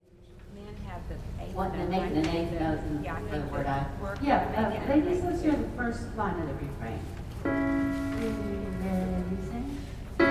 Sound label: ladies